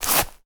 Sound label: grass footstep